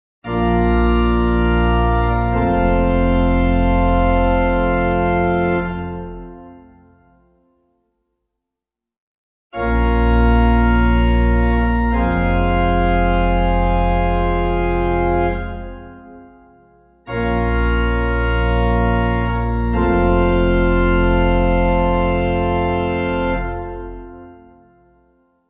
amens simple F pipe

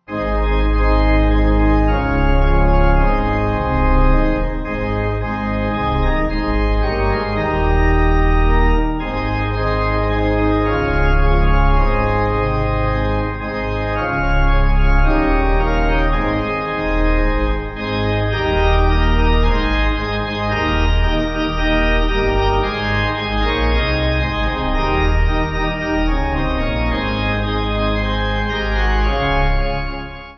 O Sometimes The Shadows, Pipe

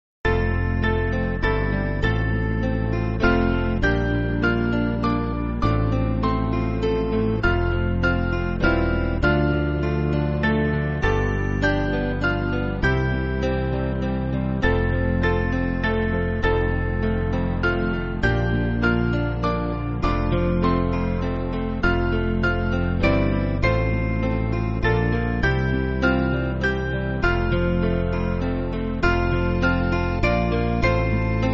Guitar

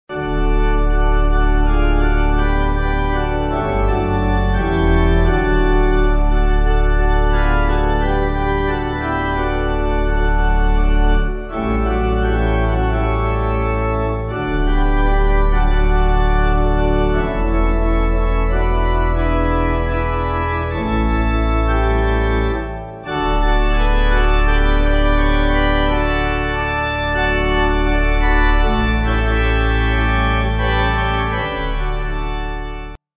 There Have Been Names, Pipe; Organ; L. Long

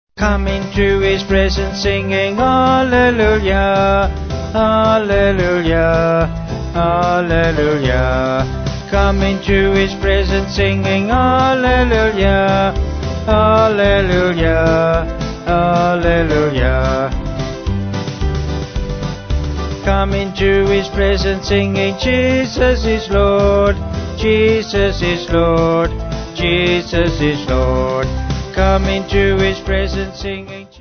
Come Into His Presence Vocals Band

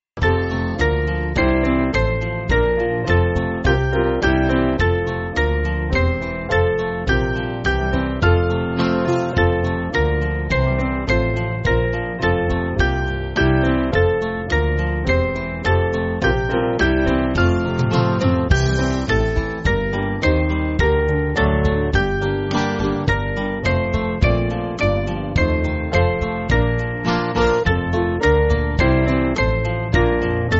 Band, church music; Werde Munter

